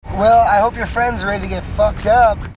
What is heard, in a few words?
taunt; taunts; stompinggrounds; props